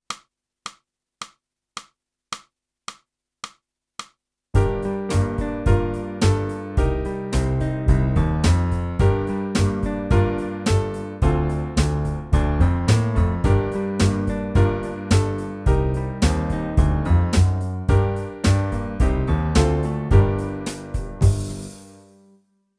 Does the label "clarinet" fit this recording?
Yes